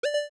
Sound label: Pause Menu Cursor; Move the cursor on the pause menu (or other menus); OOT